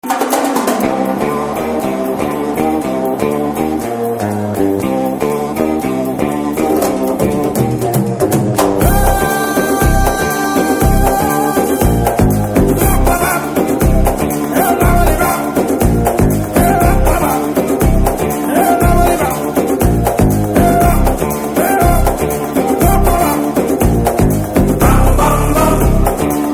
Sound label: Telugu Ringtones and BGM; Disco Raja